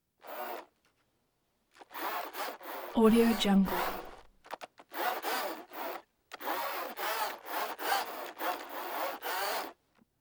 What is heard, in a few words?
servo short bursts whir mostly slow jerky